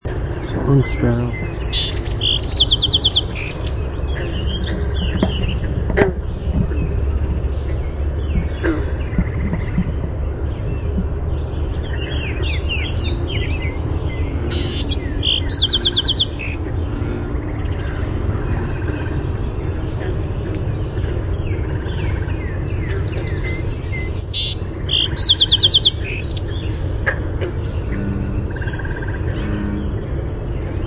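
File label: Song Sparrow song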